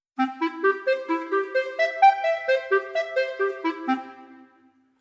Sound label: arp